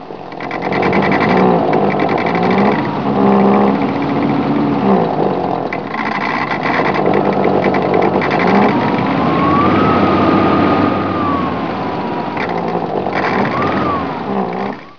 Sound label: loose fan; Muir